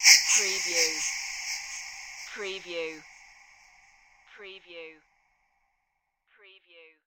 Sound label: Hit Wispy